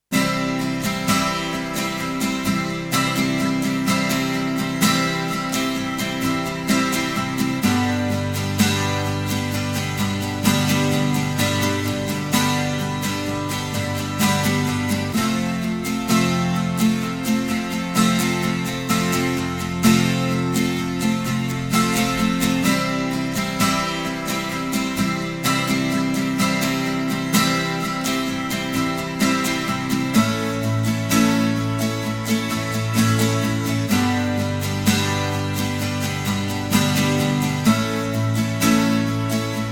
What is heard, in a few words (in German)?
gitarre